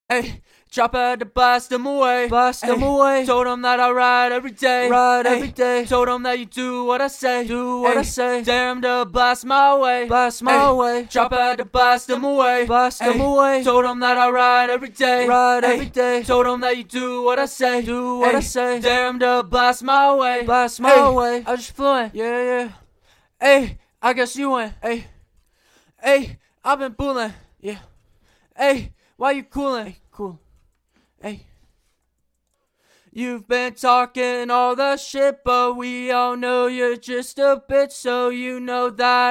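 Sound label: hip hop, 85, B major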